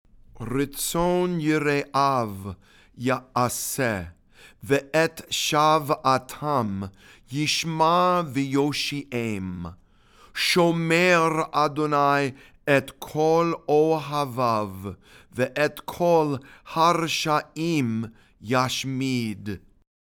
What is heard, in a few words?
ashrei read and sung